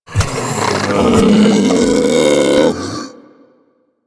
Infested Vocal Charge, Enemies; Warframe; Femur

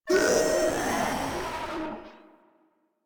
Proto Infested Runner Death; Warframe

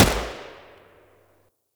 Shot; Warframe; Eidolon; EidolonJetpackMarine

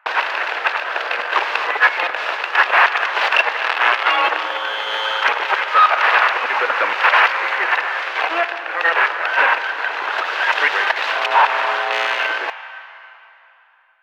TV Glitch Loop; Ambience; Props; Warframe; Nineteen Ninety Nine

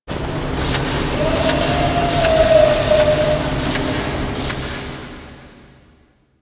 Mining Machines, Asteroid; Ambience; Warframe; Grineer Asteroid

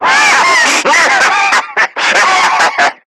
Screamer Self Destruct; Warframe; Lasrian

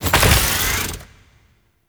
Necramech Ranged Vault Down; Enemies; Warframe

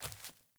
Sandals Walk Dirt, Footsteps

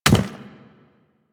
Chimera Step Default; Warframe; Ballas Chimera; Steps

